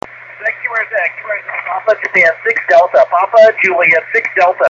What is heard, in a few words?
50.106 MHz, ham radio; PJ6D FK87 Saba Island